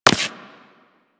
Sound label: Laser Impact Generic; Surface